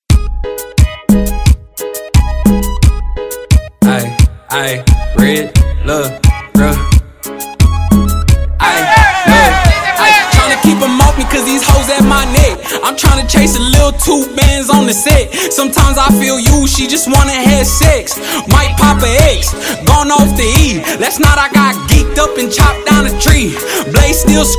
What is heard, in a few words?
Cumbia